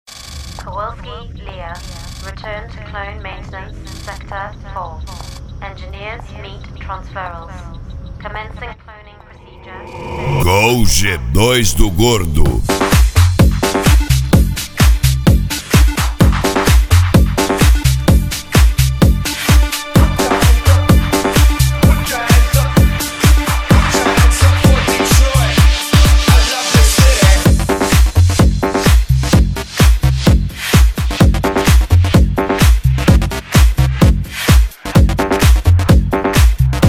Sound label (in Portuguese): especial retro; gol g2 do gordo